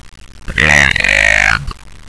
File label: boom attack; svencoop; hlboom zo